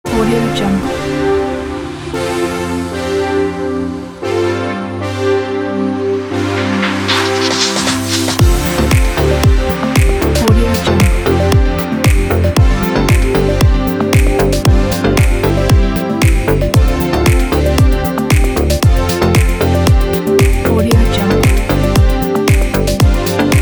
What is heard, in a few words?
electro house brass